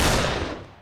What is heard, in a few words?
shot Gun Shoot